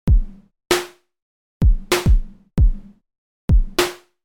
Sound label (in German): kompressor preset nachbauen beat dry; produktion; plugins ubungen